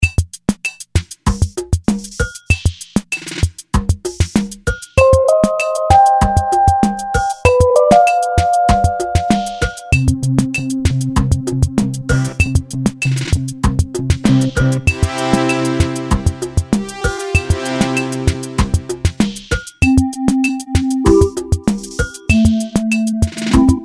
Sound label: toy keyboards